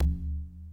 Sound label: PAD